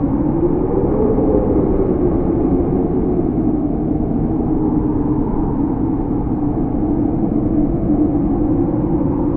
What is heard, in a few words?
wind, ambient; STALZONE